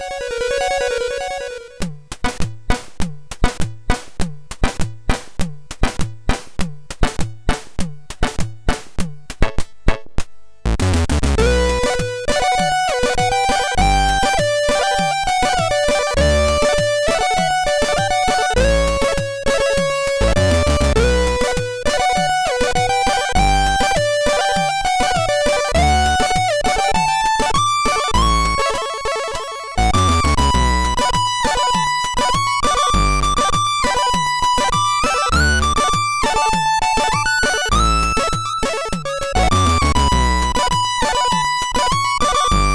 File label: tune, MOS6581R3